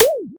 enemy gets hit; 8 eyes

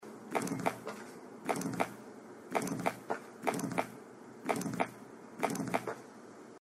rolling; Titan Souls

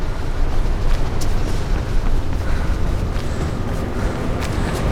running; mirrors edge

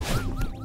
celeste sound collectible touch

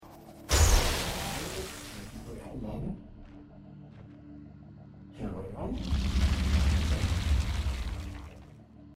ice; risk of rain 2

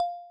sfx plastic bounce; duck game

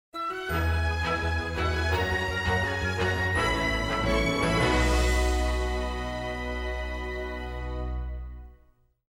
loading jingle; sims 4

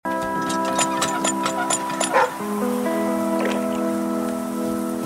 drink; The Flame in the Flood

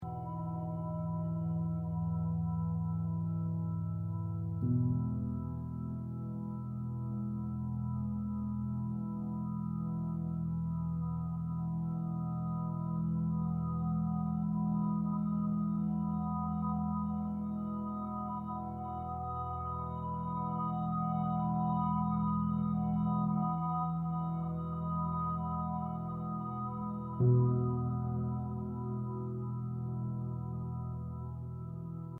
church musik; Life is Strange 2; gamesoundopedia

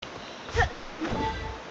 double jump; kena bridge of spirits

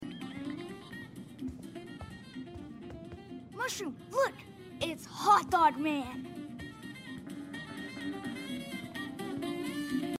inside motel sound quelle moves music; Life is Strange 2; gamesoundopedia

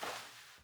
fs carpet medium; stanley parable

